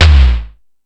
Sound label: Dance 2000, Drum Machines E-MU Pro-Cussion